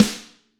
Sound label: small reverb steel snare drum sound G sharp key; Duration 0:01; Channels Mono